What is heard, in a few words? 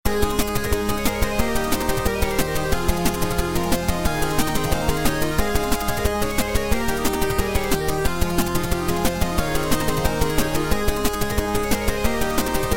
BGM Menu